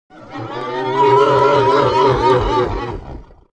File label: soul slash